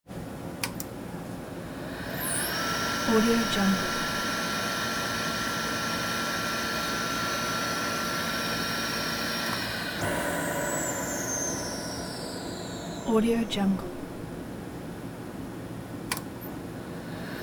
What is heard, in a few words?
xerox 8700 laser printer air suction